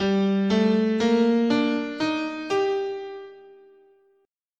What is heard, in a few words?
G Hirajoshi, scale